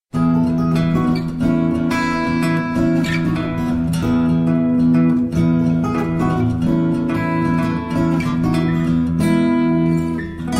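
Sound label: guitar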